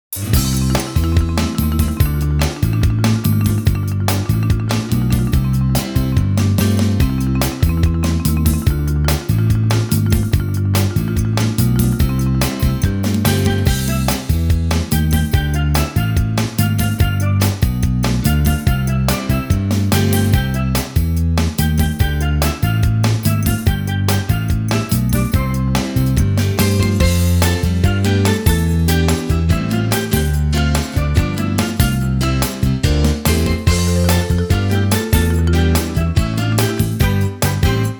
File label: there were shepherds backing